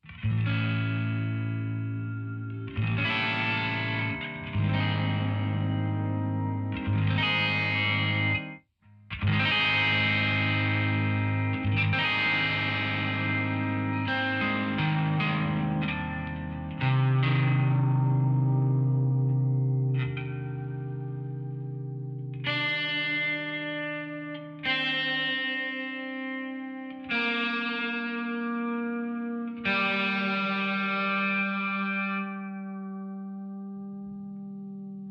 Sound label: comb